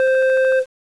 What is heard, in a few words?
beep; tfc; toast